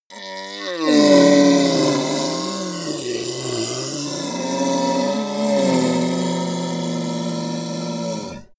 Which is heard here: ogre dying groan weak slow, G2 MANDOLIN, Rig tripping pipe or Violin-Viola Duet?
ogre dying groan weak slow